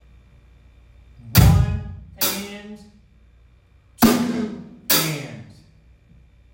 eighth beat, drums

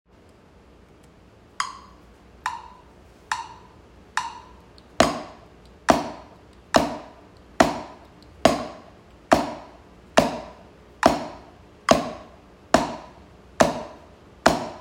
quarter, drums

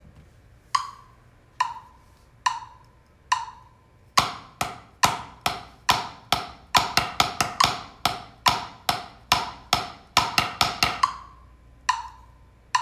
sixteenth, drums

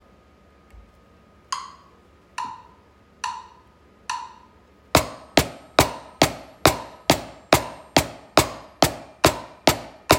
single stroke roll, drums

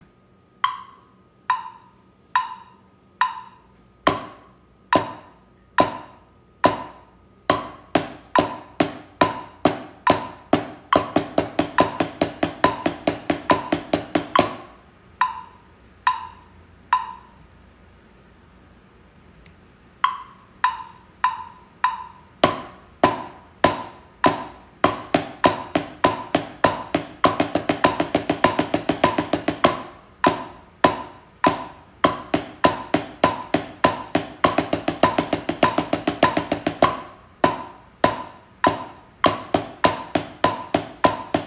subdivision chain

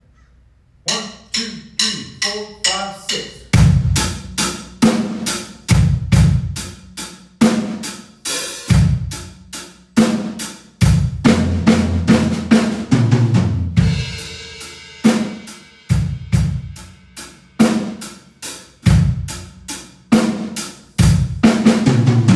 6 8 fills, drums

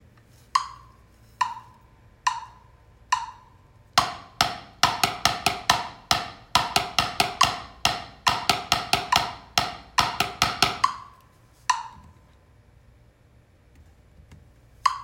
sixteenth, drums

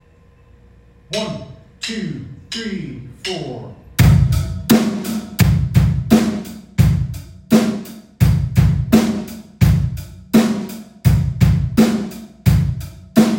eighth kick, drums

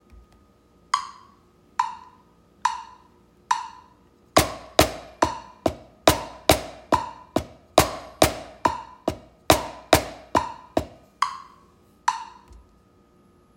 single hand, drums

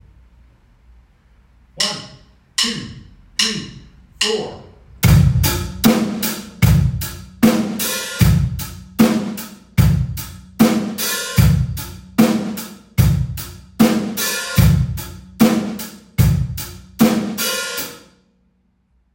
hi hat techniques

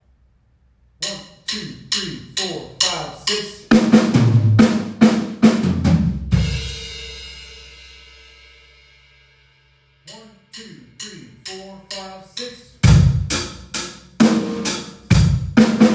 6 8 fills, drums

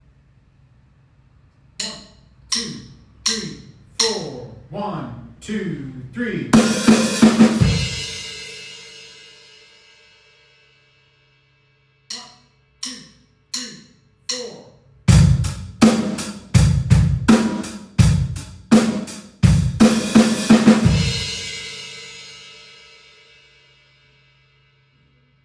starter fills, drums

